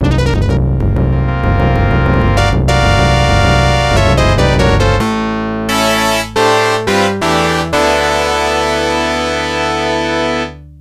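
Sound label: background music; Forgotten Worlds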